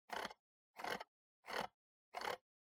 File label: PC Mouse Scroll